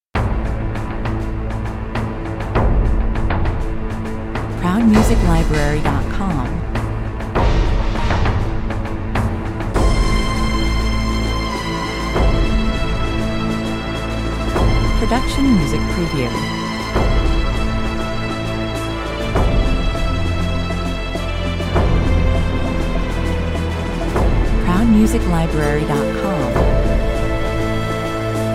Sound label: tribal drums,pipes and orchestral strings invoke a 'native American wilderness, 100 bpm; tribal drums,pipes and orchestral strings invoke a 'native American wilderness' feel - paradise found and lost - extended loopable secti